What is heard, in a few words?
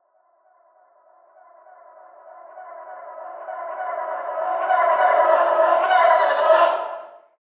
Stress Horror